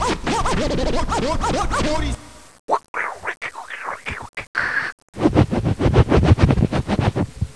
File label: scratch